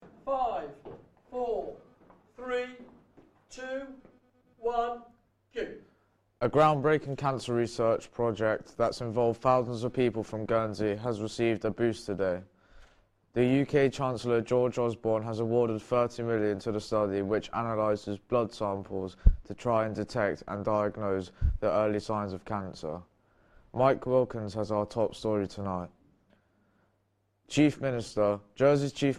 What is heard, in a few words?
Studio Recordings